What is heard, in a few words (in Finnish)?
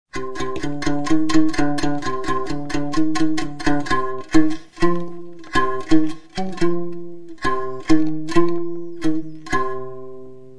Sipsibasso